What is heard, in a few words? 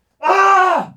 Man Hit Scream